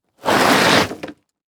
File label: Foley Plastic Skid On Dirt Quick